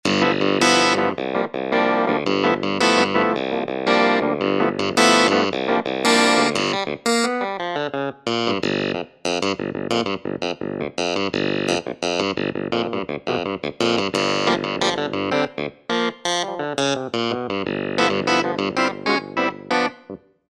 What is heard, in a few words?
Clav Control, Korg TR-Rack